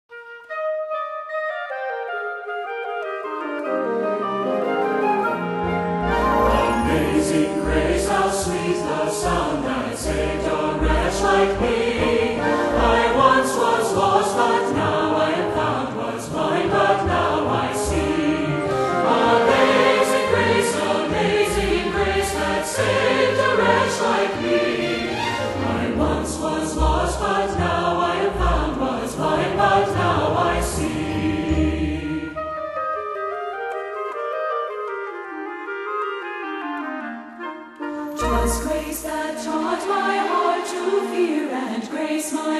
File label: Choir And Orchestra, Amazing Grace